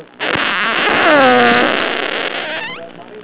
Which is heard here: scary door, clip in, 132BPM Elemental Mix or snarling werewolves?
scary door